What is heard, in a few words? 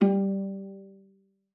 VIZP G3, VIOLA PIZZ; Xsample VOL-5